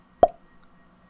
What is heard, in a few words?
Mouthpop